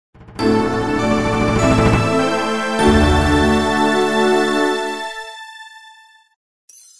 level up

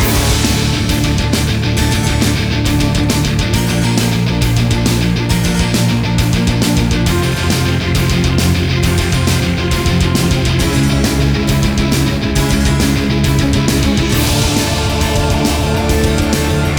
Bar Fight; Orcs Must Die

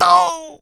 take damage large; Scrat

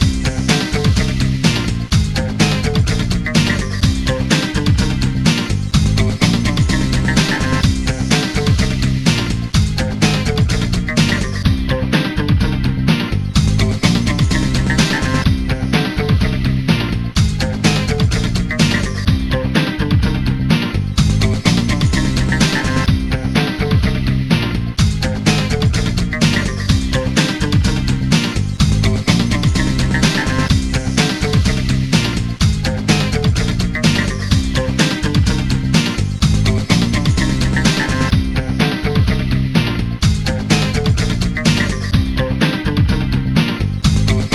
Bgm Main; Ready Steady Cook The Game; NDS

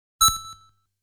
Continue countdown; dondokodon

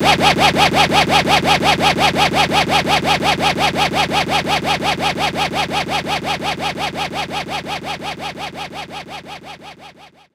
Scratch Push; knights try

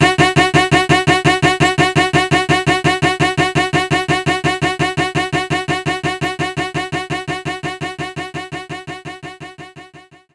Cello Attack; knights try

